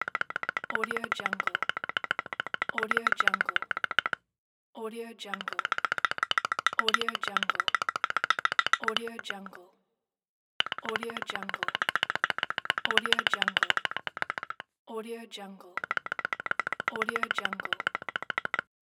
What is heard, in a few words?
teeth chattering